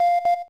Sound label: Camera timer; LG SV730